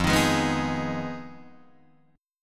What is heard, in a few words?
F Minor 7th Flat 5th F down Guitar Drop D